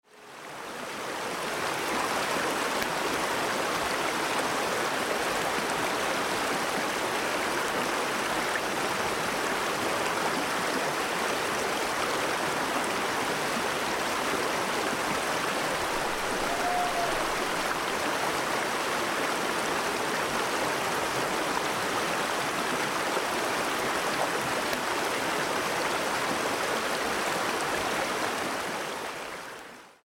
Shamans River, Soundscapes

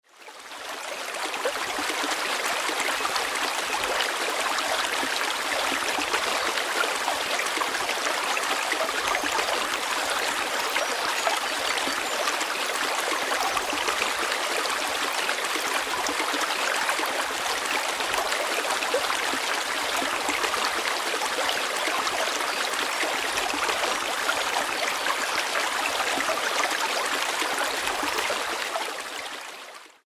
Mountain Stream

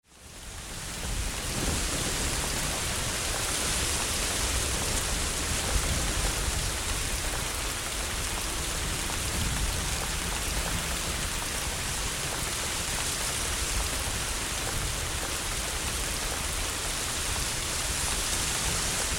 Rainstorm

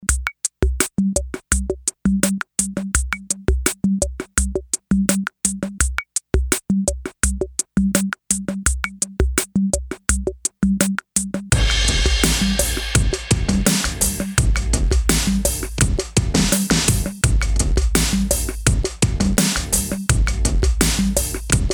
91 BPM Slinky Pattern

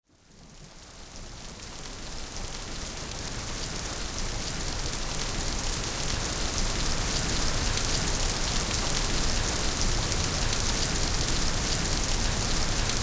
Thunderstorm

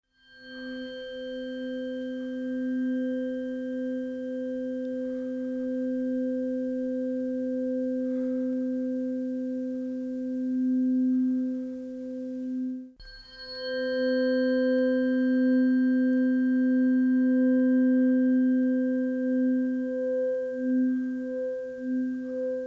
Fibonacci 1 2 octave; Sacral Chakra

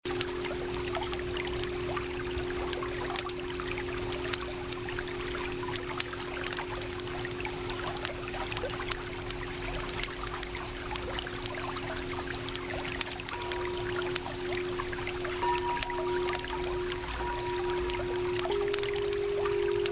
Sleepy Night with Bowls, Soundscapes

